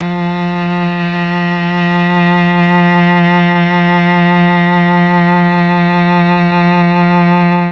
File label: F3 mellotron cello